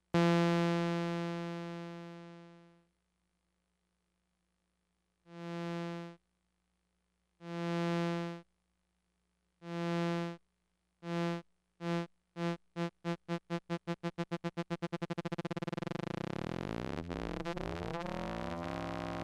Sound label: Serge FS Use of VCA; Frequency Shifter; SergeModular; Frequency Shifter Samples